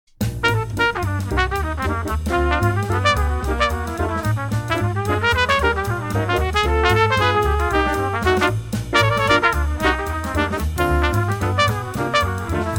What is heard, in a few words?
trumpet duets Trumpet